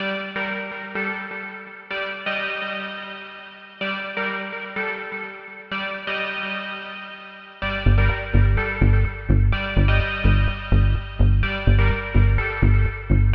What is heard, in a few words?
Techno; Berlin